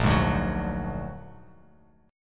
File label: A sharp Augmented 7th A sharp Bass Standard; A#+7 strummed; JGuitar